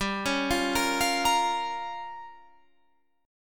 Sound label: A sharp Minor 6th G